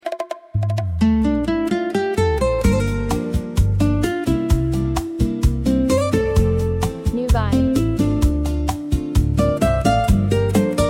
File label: Greek samba